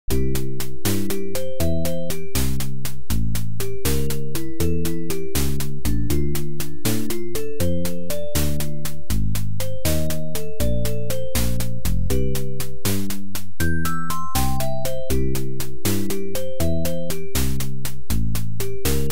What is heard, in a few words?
stage music; Golden Fire II; arcade